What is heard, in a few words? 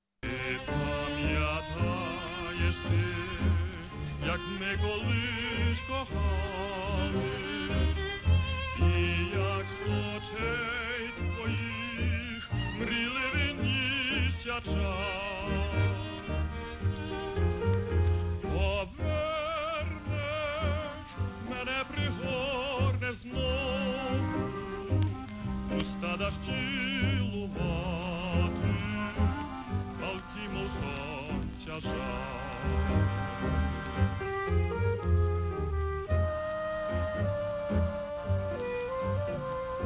Ukrainian tango